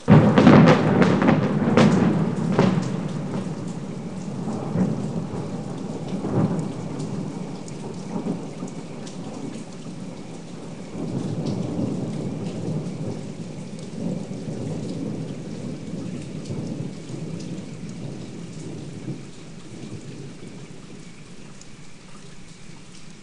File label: Lightening Crack Thunder